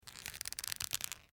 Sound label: Rope Strain